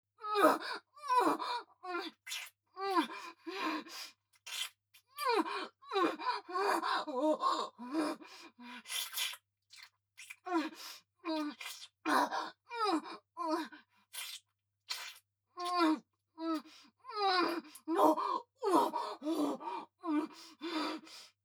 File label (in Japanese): バック喘ぎとクンニ・通常; ヘレナ